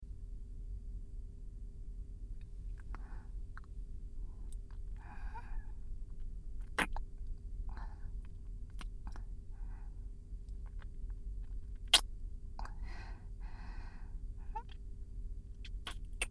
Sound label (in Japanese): ディープキス 加工なし; ノイズ処理なし